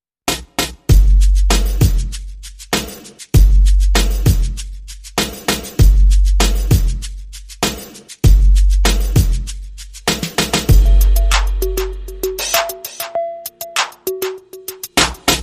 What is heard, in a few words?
Drums and Perc Only